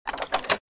Metal door unlocking opening

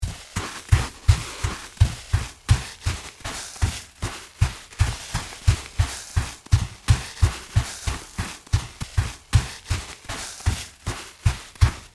Footsteps on sand barefoot run

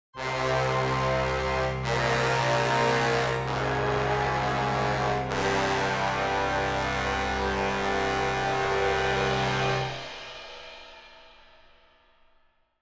Game over you lose horror

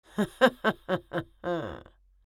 Elderly woman laughing

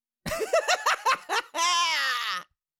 Cartoon little monster voice laugh laughter

